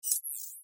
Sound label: subtle tech interface